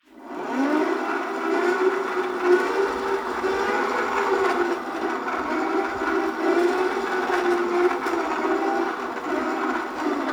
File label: rope trainer pull sequence slow long, fitnessstudio